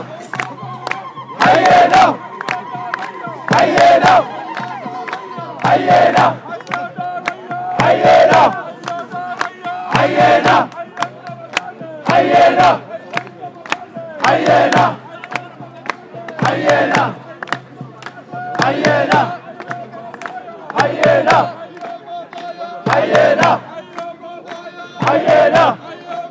chanting outside syria london embassy martyrs will live